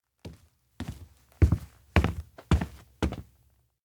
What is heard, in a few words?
steps indoor abandoned cafe walk in; anemoi